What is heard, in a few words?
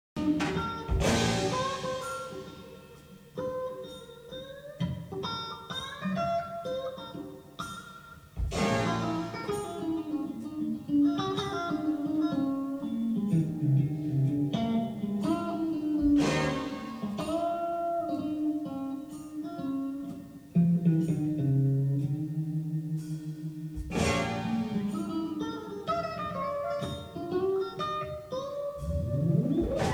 slow blues